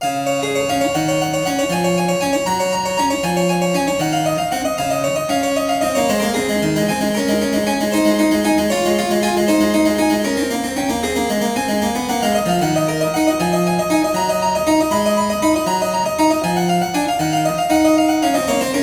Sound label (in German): Werckmeister Cis-Dur